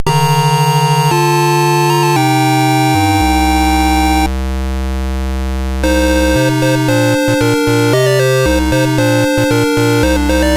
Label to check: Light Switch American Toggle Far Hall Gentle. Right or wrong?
Wrong